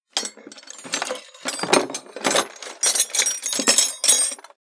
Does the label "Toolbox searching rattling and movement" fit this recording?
Yes